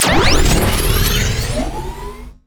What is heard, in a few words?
true shot activati; LoL; cyberezreal